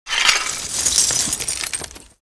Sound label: Broken glass and debris rummaging